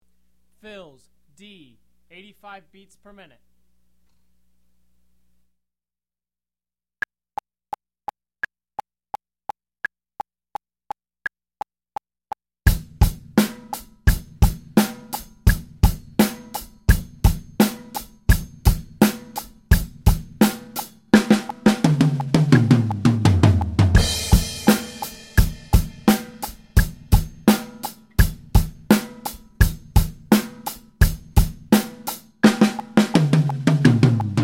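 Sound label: fills, drum; Drum Lessons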